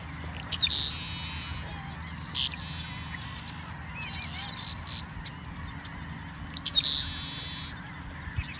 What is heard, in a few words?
seaside sparrow; saltmarsh